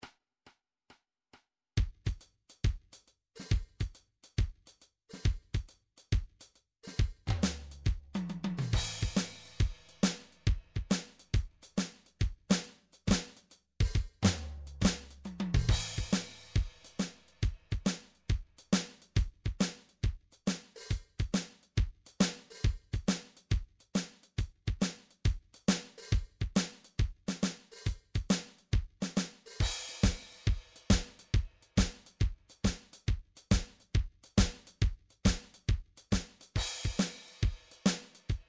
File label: blues